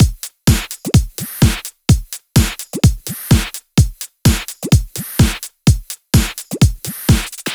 127BPM Kit; Vengeance Samples; Warhog Kit

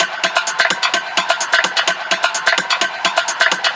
Percussive Loops 128BPM; Vengeance Samples

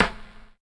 Percussion

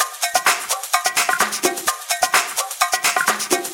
Latin House Loop 128 BPM; Vengeance Samples

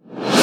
VFX Short Fills & Reverse

